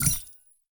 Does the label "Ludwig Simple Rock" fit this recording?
No